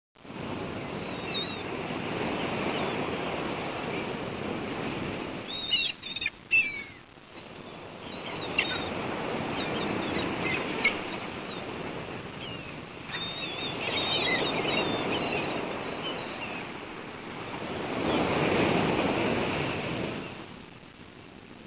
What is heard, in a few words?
Sea; volleybeach; cstrike